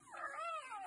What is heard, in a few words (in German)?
lachen